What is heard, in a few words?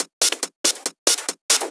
Loops 140 BPM Hihat Percussion Loops